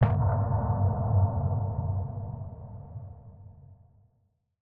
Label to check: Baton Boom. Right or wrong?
Right